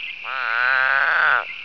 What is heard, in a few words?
couchs spadefoot call; texas nature trackers; amphibian watch